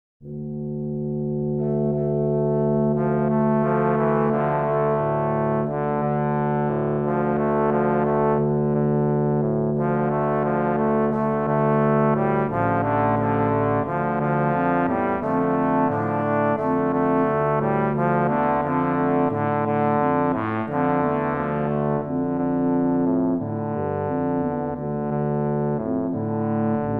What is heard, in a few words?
midi audio; Canoe Song